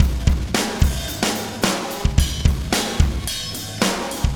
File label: Beat, Processed Drumkits; Sonic Foundry (Sony Creative Software); Processed Drumkits Zero Gravity Beats; Solar Flare Beat